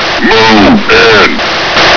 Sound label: hg charge; svencoop